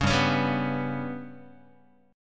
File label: G Major Flat 5th Guitar, chord; GMb5 strummed; JGuitar